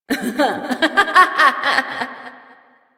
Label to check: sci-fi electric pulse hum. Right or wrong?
Wrong